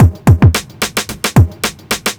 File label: block rock kit